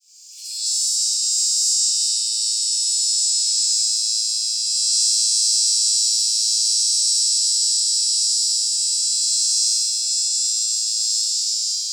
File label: Hadoa longiopercula, cicadas, filtered; US; AZ